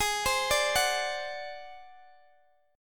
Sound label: G sharp 7th Flat 5th G sharp, down, Guitar, Open D